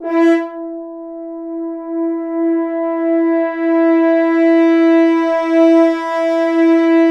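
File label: BRS FHRN FZ, French Horns sfz; Roland L-CDX-03 Disk 2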